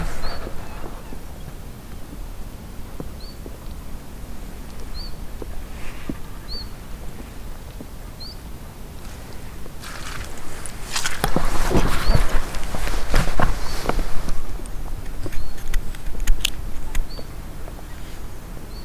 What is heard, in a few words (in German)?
taigazilpzalp